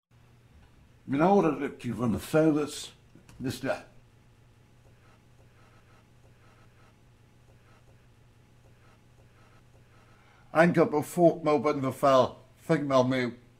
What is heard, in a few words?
old man sound